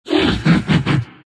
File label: nyx laugh; dota; nyx assassin